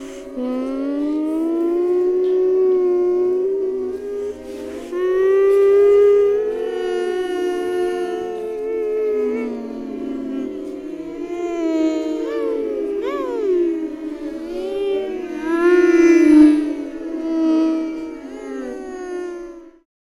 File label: Doppler